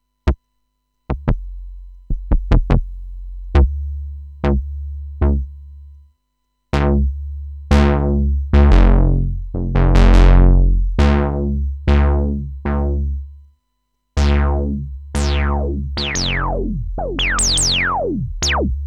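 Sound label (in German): mfb envs und reso, synth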